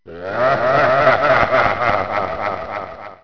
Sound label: ghostly; quake2; kitsune